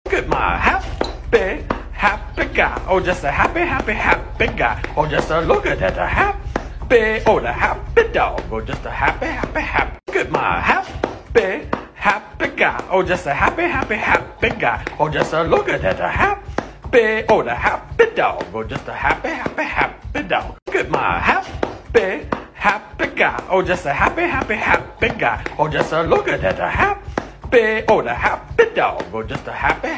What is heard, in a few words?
Happy Dog Meme Song